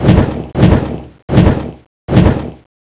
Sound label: attack fly poison; boss Angra